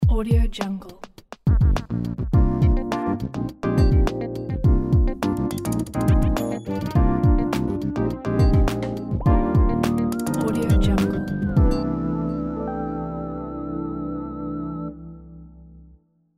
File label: fashion logo